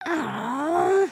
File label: sigh